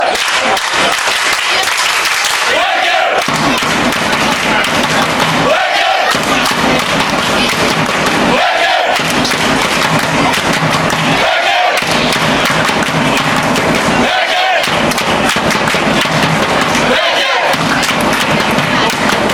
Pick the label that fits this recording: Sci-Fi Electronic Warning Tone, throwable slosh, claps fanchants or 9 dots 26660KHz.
claps fanchants